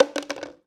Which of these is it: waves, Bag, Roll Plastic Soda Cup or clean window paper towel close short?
Roll Plastic Soda Cup